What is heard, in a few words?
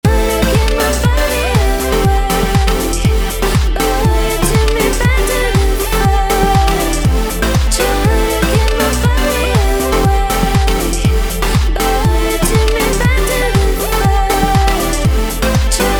Synth EDM; Komplete